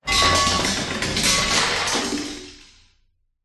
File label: impacts metal various items junk drop clatter lower pitch room reverb; zapsplat